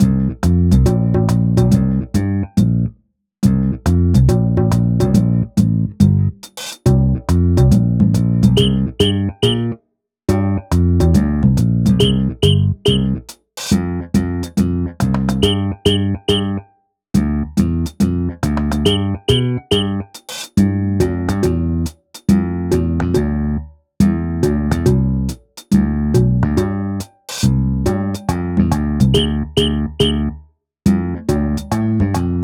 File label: BGM ベース; Heroland